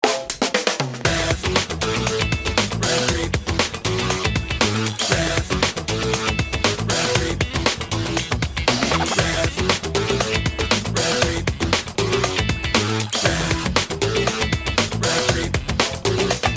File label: ringtone